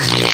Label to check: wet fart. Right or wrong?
Right